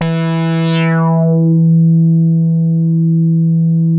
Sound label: E4, Bass; E-MU Producer Series Vol. 6 – Analogia Project (CD 1); Boom da Bass 2